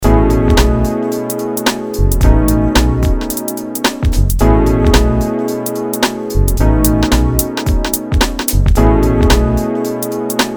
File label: inspire lounge loop